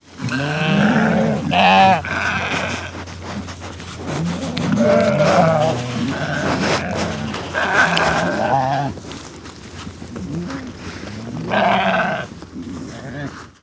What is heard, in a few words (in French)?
mouton